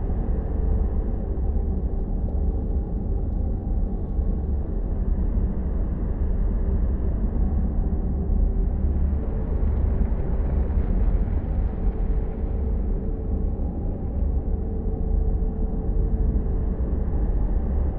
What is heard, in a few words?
ship science ship idle; aquatics